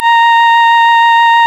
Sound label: ORGAN, A#; Big Fish Audio; Synclav FM